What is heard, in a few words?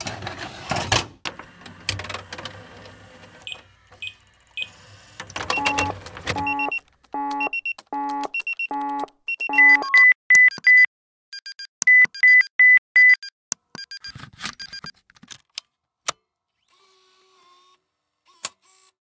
locker hack stop; Original TK Sounds